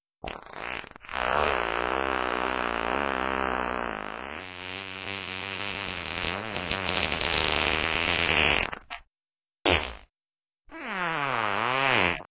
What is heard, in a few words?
fart sound